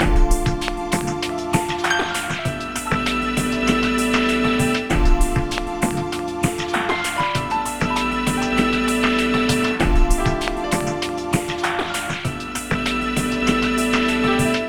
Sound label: brushed bpm98 loop; brushed cheek; Beatsuite